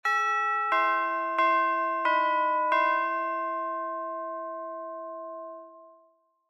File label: Theme - CHIMES ONLY